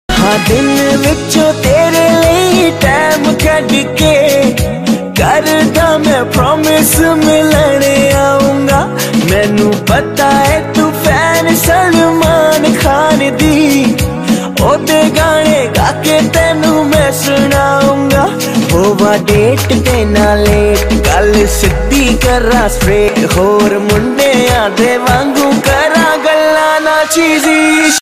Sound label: Romantic Ringtone